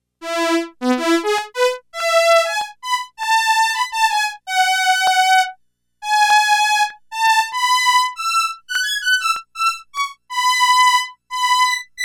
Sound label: Fiddle